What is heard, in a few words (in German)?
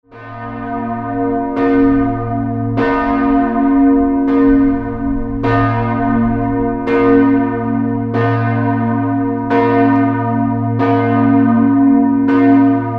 glocke